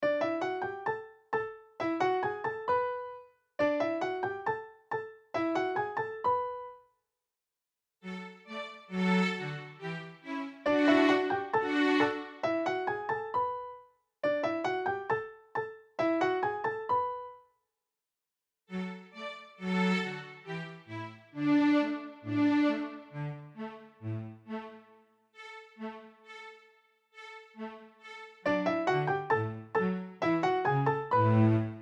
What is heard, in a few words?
Piano Trio